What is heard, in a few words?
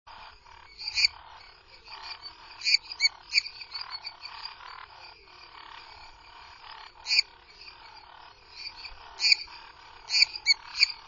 Dendropsophus minutus